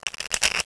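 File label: shuffle; Video Strip Poker; Torquemada Games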